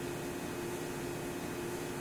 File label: stn aircon; tetsuon